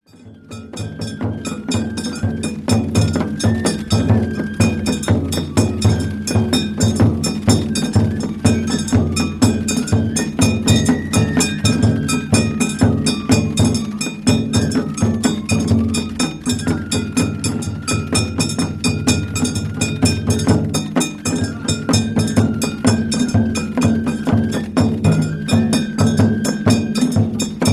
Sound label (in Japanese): 弥生祭お囃子